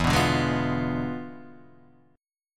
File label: E 7th Flat 9th, E7b9 chord; strummed